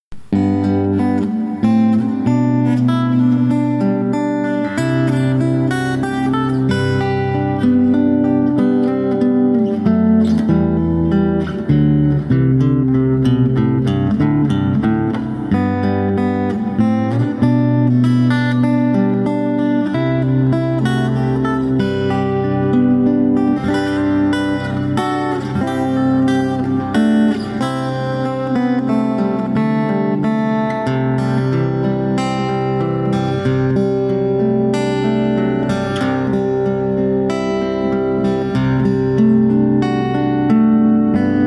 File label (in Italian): Musica